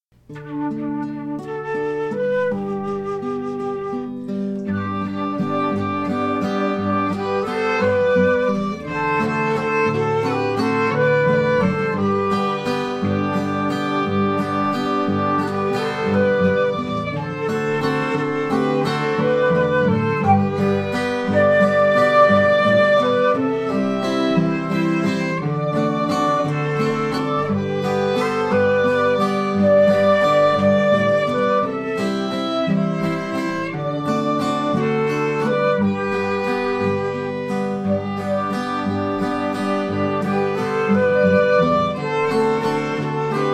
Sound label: I am so glad instrumental